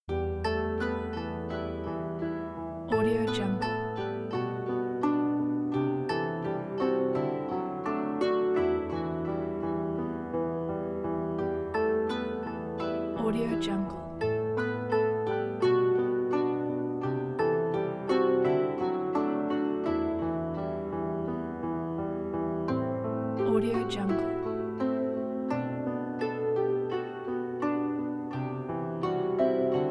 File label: the turkish